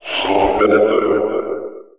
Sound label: blinkspk1; mod xj18; skullwiz